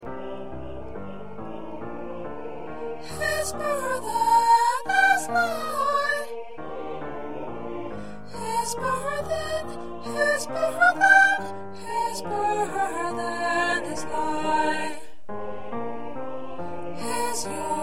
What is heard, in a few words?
Soprano, choir parts